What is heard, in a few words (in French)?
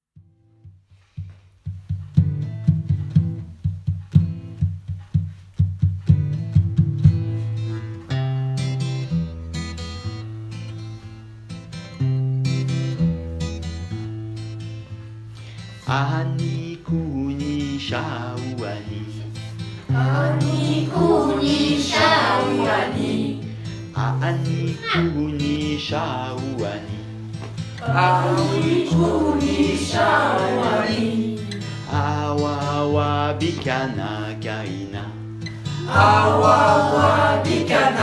Ani Couni Voix